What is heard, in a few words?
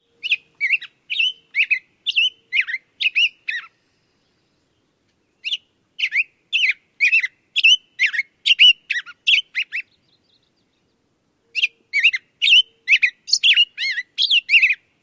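Black-headed Grosbeak song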